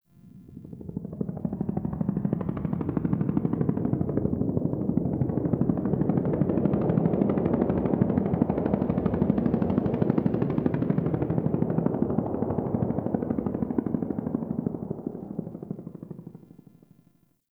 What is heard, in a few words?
Distant Ambient Helicopter; Warframe; Ambience; Nineteen Ninety Nine